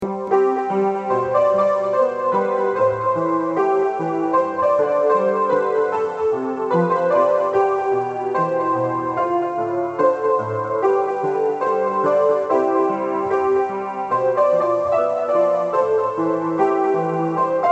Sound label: teamsong ringtone guitar